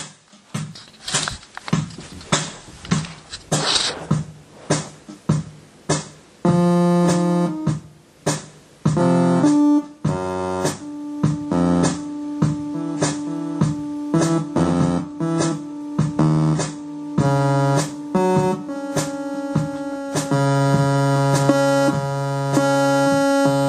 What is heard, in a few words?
Saw Lead